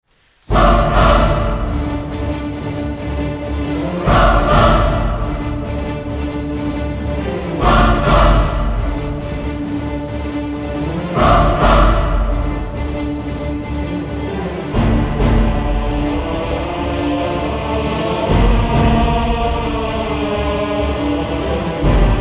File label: orchestra choir